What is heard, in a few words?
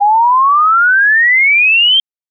sweep 2s 800Hz 3200Hz; LaSpezia; CESI